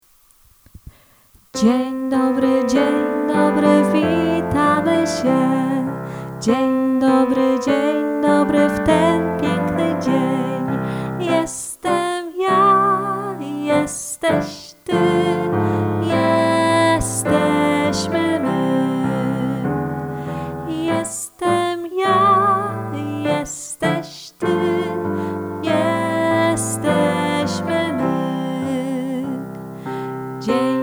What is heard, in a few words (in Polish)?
piano wokal; LOGORYTMICZANKI